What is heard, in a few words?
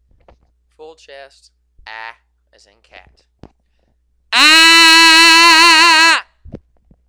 Full-Out AAH